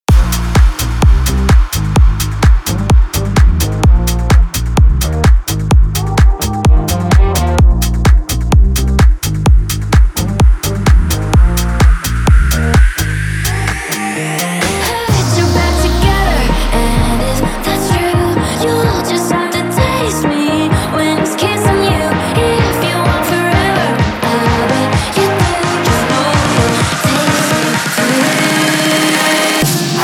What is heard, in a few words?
progressive breaker 128 bpm